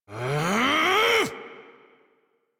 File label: Exert Adult, Melee; Warframe; Duviri; Drifter; en